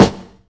fat steel snare drum sound g key, snare drum sample tuned to the G note; snare drum samples